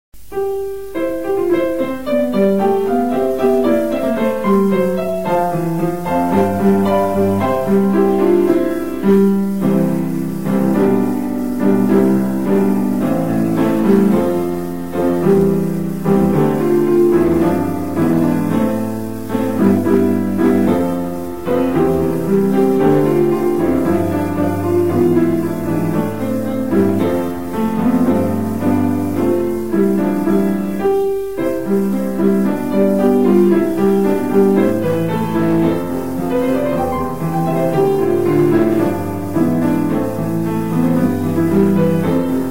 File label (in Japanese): piano bannsou